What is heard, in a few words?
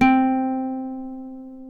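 C3 FLAMENCO, FLAMENCO guitar; WorldSounds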